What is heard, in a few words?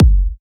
Bassdrum